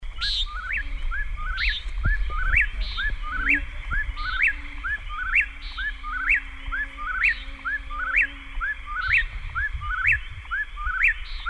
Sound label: Whip poor will